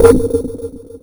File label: bass, fx & sweeps; EZRollers